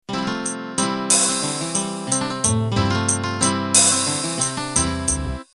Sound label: 8 Beat slow 70s Intro, MIDI; #8BSlo70.sty compatible MIDI file Intros and Endings